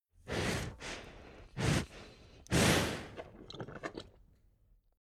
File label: Nature Animal Beef Bull Heavy Breathing And Gutteral Noise Monster